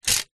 household clothes hangers plastic bunch several set down; zapsplat